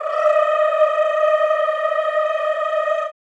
CHO Rrrr

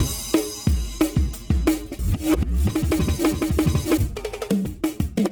180, loops drums; Arks; RoBKTA Sample Pack Supreme

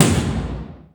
W TOM 04, drums; East West - Global Sounds

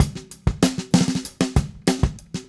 Drums Fill, 70s Analog Funk; Sonic Foundry (Sony Creative Software); What it Is! 70s Analog Funk

